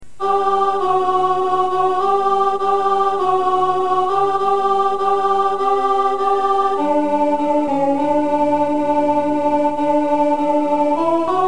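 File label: o little davies alto; Christmas; carol tracks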